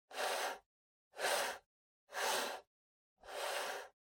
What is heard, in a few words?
PC Mouse Move Wood Mid